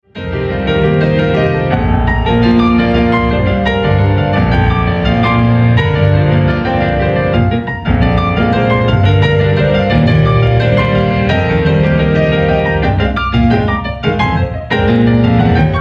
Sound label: Piano Piece